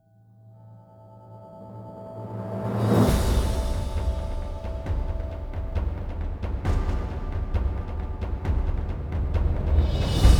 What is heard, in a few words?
percussion only